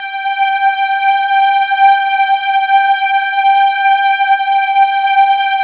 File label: PINGER G5, WAVESTATION; Greytsounds VOL-1